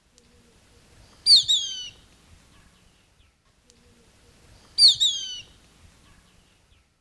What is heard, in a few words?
Ictinia plumbea, call; S. America; Eastern Lowlands